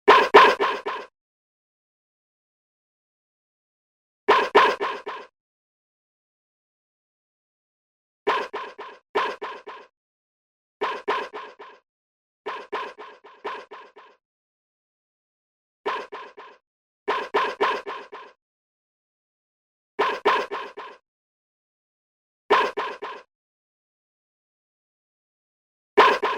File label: Barking; Boney; MOTHER 3 OST